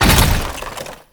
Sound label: Collision Fast, Motorcycle